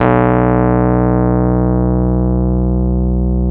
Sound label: B 5, Electric Pianos; Wizoo Electric Pianos